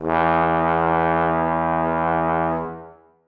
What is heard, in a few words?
bass trombone e2; Sonatina Symphonic Orchestra